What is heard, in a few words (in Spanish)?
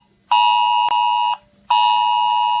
Sonido alerta